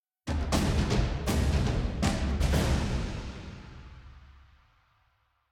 String; Assassin's Creed Unity Dead Kings

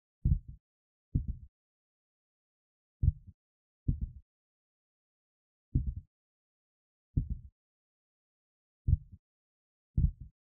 Walking; Franklin; Don't Be Afraid The First Toy

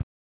Ball Lands on Grass

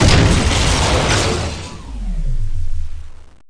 die boss

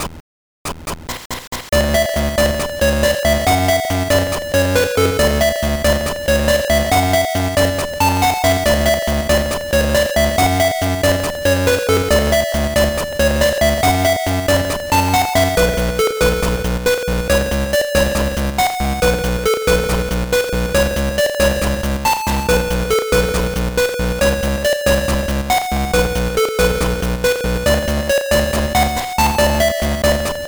Level Music

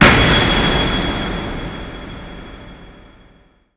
Stinger Shine Door

